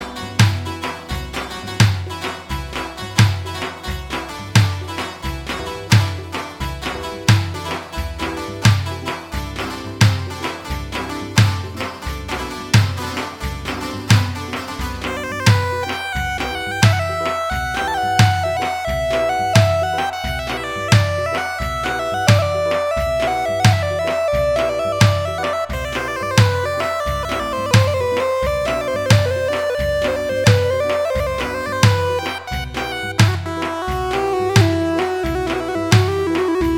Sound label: Guitar